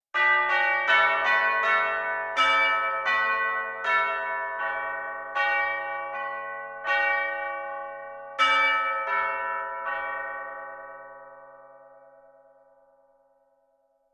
Melodic church bells sunday ceremonial

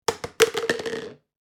Plastic food container falling impact

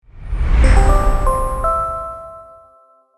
Corporate ident effect intro podcast; logo animation

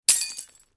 Lightbulb break shatter explosion; torch sound effects

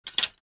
Clock mechanism replacement

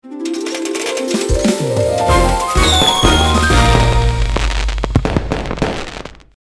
Jackpot reveal suspense casino slot machine

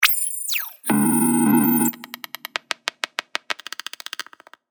Glitch tone

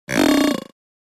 Toy robot talking

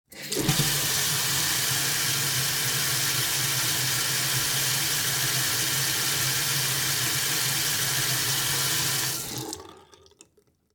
Bathroom sink running water high pressure

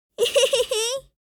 Boy child laugh chuckle

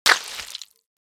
Vampire bite aggressive attack

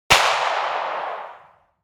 Beretta handgun single gunshot distant

